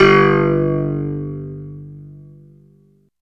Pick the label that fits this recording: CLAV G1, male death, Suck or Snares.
CLAV G1